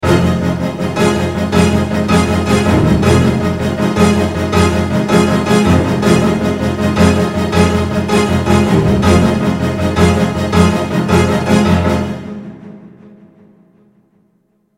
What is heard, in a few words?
Full Orchestra